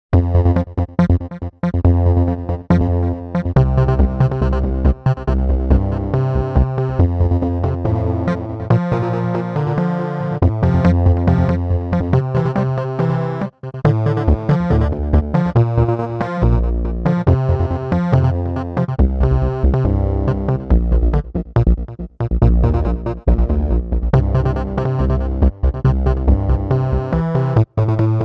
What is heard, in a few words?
MEDIEVAL TIMES SEQUENCES